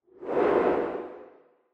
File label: Camera Motion Mid Reverb; シーン・カメラモーション